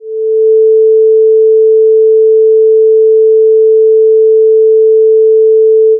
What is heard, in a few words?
Left And Right